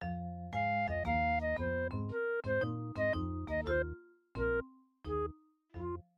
MIDI Animation stings

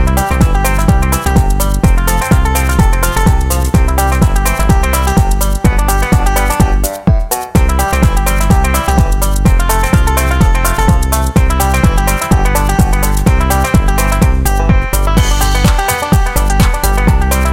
Upbeat banjo

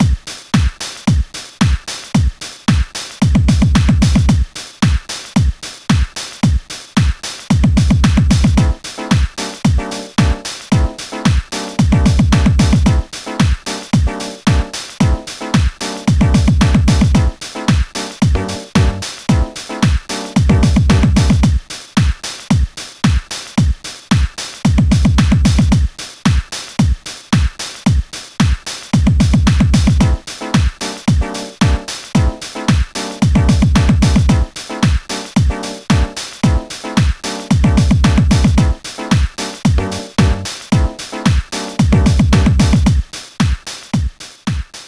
Electro; Pinky and the Brain The Master Plan; GBA

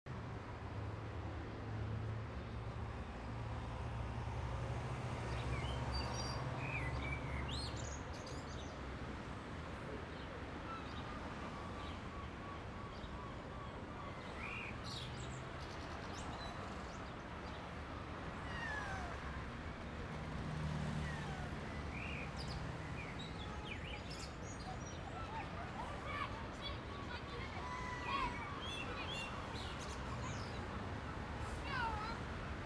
urban ambience; gamerip; PlayWorld Superheroes

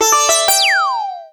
Character Attack

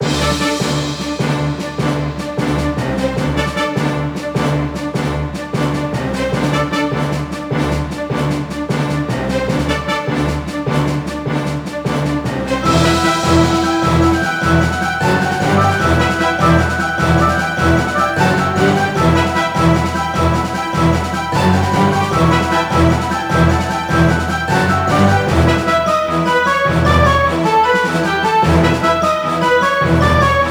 Battle; mercenaries wings the false phoenix

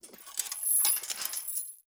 SFX Math Puzzle Chain Pull; Adam's Venture Origins

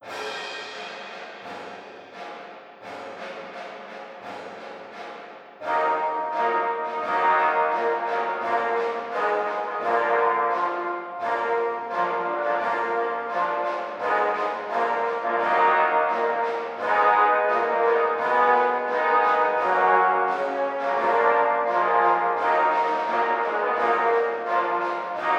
Hymn

